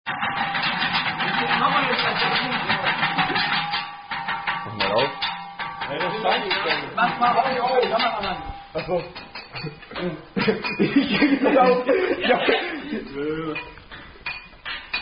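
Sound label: Männerabend Trinkspiel Tanzen Singen Saufen, Unknown German Drinking Game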